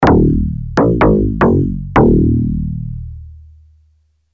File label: dx7; yamaha; synth